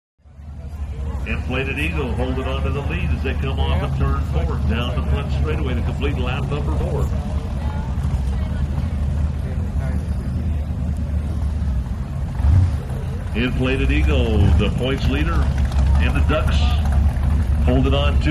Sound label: Boat Race Crowd Amb, Off Shore Power Boat